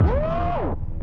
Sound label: Vox Woop; Lost Tapes From Mars